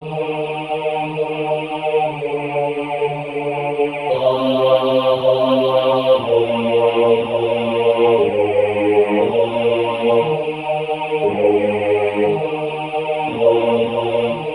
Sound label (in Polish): Bas na tle SATB; Chrystus Wodzem